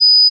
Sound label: E flat 8, pure tones